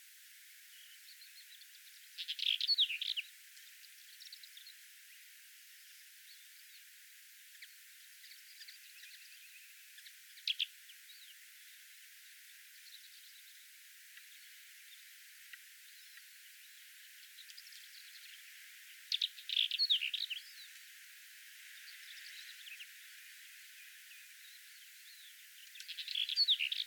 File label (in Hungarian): déli hantmadár hangja